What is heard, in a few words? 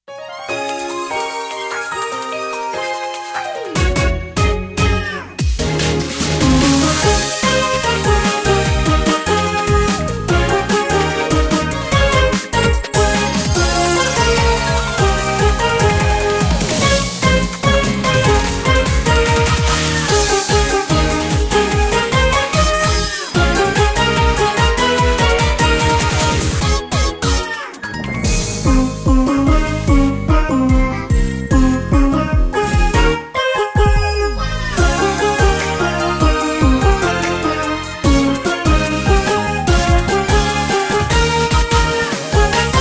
Bgm trial; twinkleping